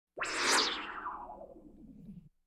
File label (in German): Science-Fiction Schnell Vorbeifliegender Frequenzton